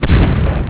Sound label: Big gun